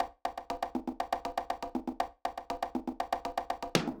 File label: Beat Congas, Old Skool House, 120bpm; House of Amnesia; Big Fish Audio; OSH Construction Kits